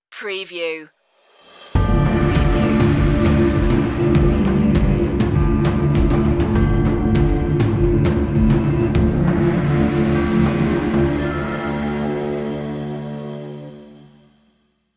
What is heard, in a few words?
ambient army stinger